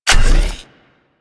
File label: Arch Dual Pistol Fully Charged; Weapons; Warframe; Archwing